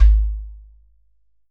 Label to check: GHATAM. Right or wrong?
Right